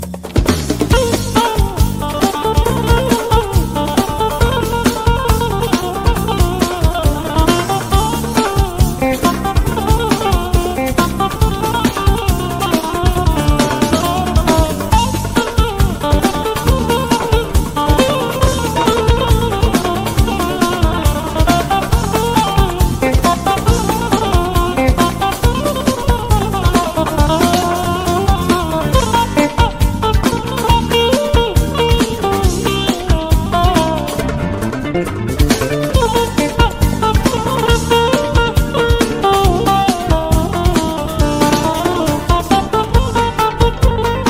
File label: Turkish Electro Guitar